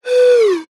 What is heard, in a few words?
musical pipe metal blow over top whistle tuned pitch descend